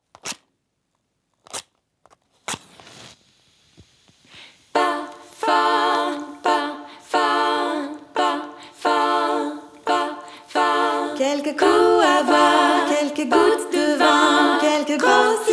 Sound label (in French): Jingle feu